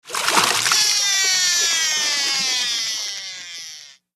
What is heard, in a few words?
Fish Reel Strike Line, fishing